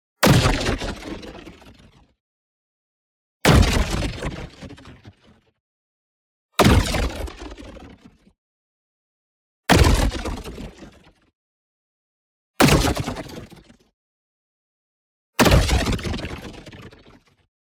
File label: IMPACT MEDIUM Cyclotron Activity; boom library; Matter